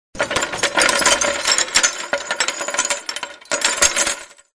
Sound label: crashes metal glass; Audio Mangler